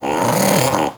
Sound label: Armadillo Vocal Alerted; Warframe; Characters; Hunting Critters